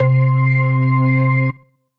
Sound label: Organ, Tonewheel Organ; Electric Keyboards; Organ Mustard Ball Park; Ableton; Factory Packs